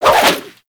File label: Sword And Whip Spin Whoosh; Warframe; Weapons